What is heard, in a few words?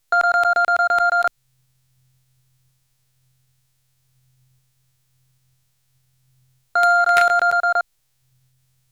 fm radio; communication